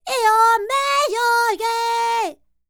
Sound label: Vocal Planet World Voices, NOMADIC